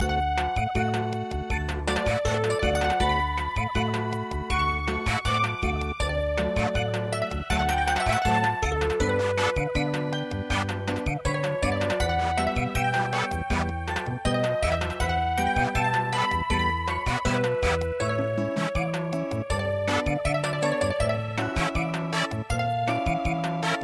Meditation Samba; midi; traditional

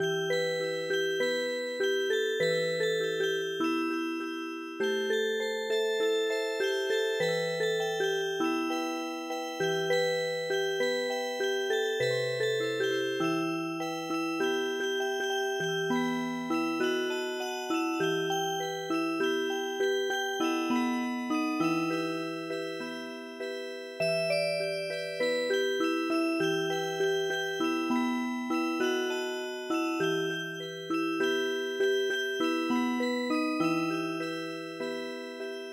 Music Box, midi

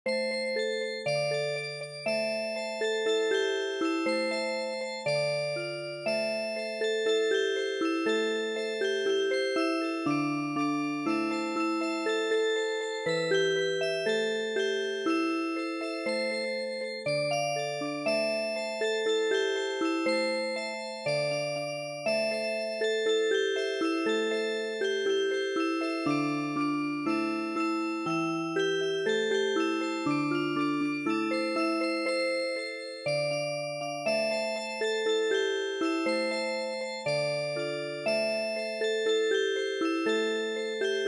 Music Box

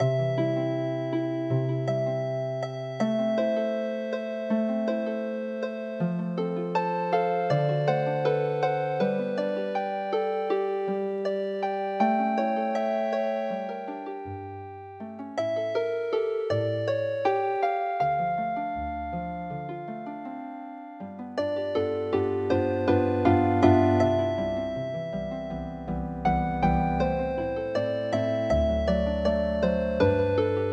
Air on the G String Healing Harp

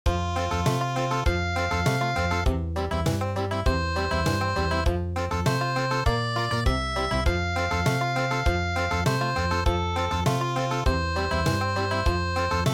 Country, midi; Hark hark the lark

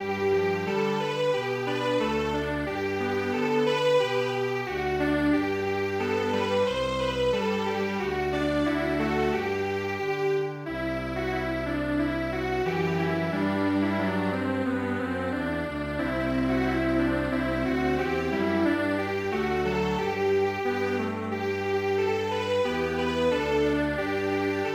Yankee Doodle Strings Quartet

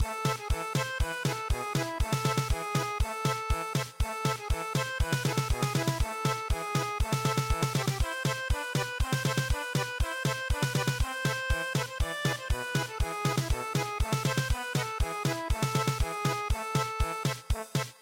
German Polka

